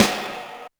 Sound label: snaredrum, Yamaha RM 50